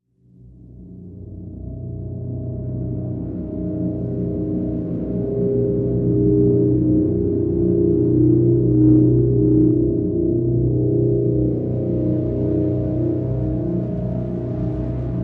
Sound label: Dormant; Drn Dormant B